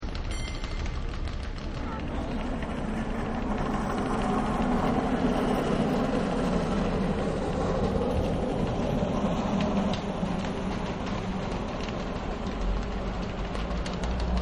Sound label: Cable Car Pass, Streetcars; SanFran; Vehicles; Sanfran Cablecarpass2